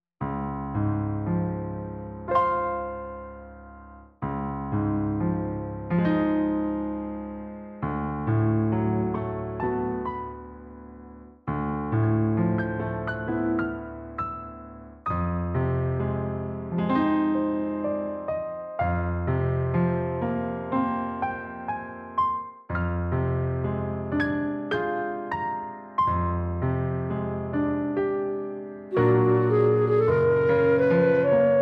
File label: SWEET MELODIE